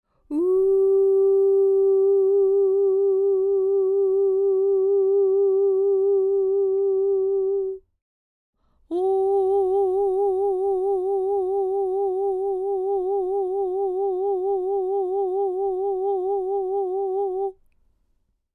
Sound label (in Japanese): uragoe